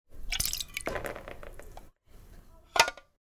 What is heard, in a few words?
tea spill